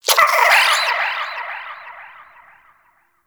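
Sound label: Vocal Captured Lift Away, Hunting Critters; Nexifera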